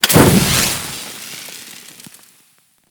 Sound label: Idle Power; Warframe; Ember